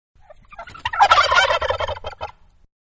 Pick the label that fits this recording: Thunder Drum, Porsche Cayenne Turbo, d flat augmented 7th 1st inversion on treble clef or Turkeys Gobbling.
Turkeys Gobbling